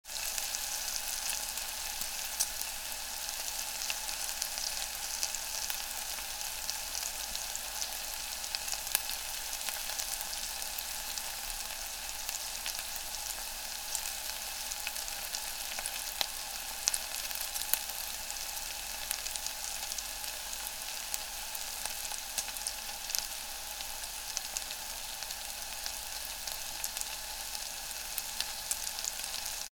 Tieng nau thit

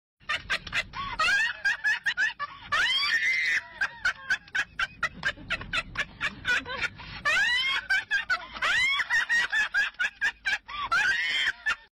tiếng cười

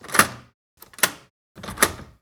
Tiếng chốt cửa

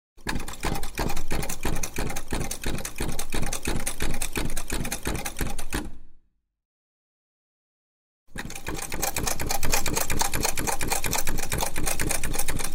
tieng dap may may quan ao ngay xua

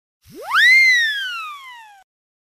tieng huyt sao hoat hinh

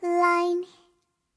am thanh thong bao line tinh

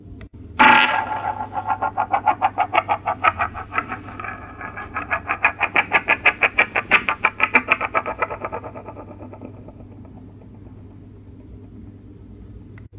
Tieng dong xu roi va xoay tren ban go

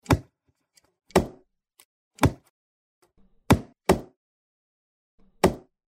dong dau van ban giay to

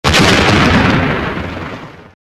tieng sam chop bat chot trong ngay nang

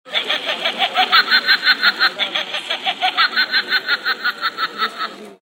Tiếng con búp bê cười gian ác ghê sợ

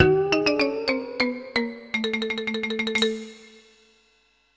Âm thanh trả lời sai

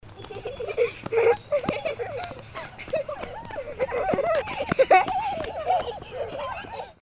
Tieng tre em cuoi dua va chay

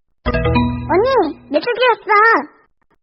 am thanh thong bao iphone de thuong

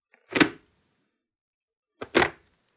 Tieng mo dong mat na mu bao hiem

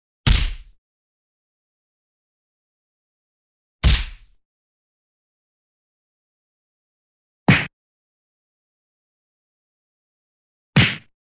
cu dam vo quyen anh

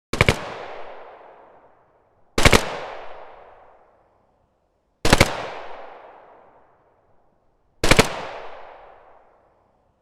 Tieng sung truong ban ngat quang

